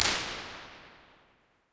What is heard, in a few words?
basic fvn rir